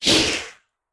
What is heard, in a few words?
Anime Super Action Whoosh